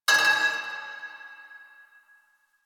Pipe Cement Drop; Warframe; Ambience; Nineteen Ninety Nine

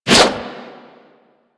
Prime Ankyros Whoosh E; Tenno

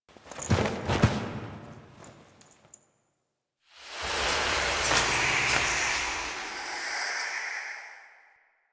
Foley; Cinematics; Warframe; The New War; Zariman; Void Kid To Drifter Reveal

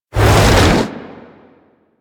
Tree Slam Woosh; Enemies; Abilities; Warframe; Teralyst

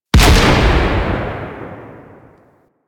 Four Barrel Rifle Shot

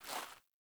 Boot Walk Gravel, Footsteps; Characters; Enemy; Melee Grunt; Boots; Sinecure

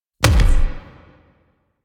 Punch; Warframe; Quests; The New War; Weapons; Omicrus

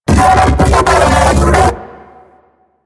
Mech Ambient Vocal; Warframe; DE; Vehicles

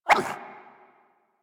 Puppy Interact Bark Speak; Warframe; Kubrow

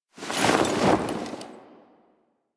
Camp Canopy Flap

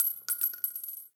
Shell Casing; Target Practice; Warframe; Nineteen Ninety Nine; Quests; Quincy

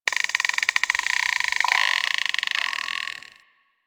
Beach Tree Creak; Ambience; Warframe; Grineer Beach

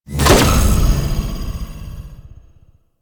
Lev Activate; Warframe; Warframes; Trapper

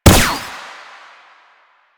Gunbrella Bullet Deflect, Weapons

